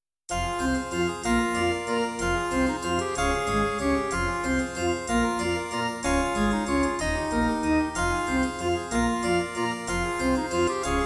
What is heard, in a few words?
Kawai GMega; Death Gate - Track 6